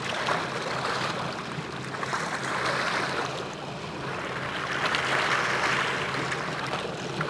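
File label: water loop; Splashdown; XBOX; Soultree; Havasu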